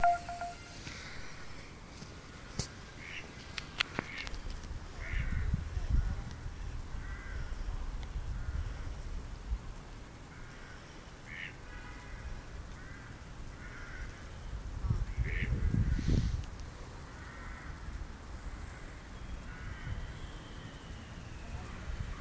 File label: sounds of sleaford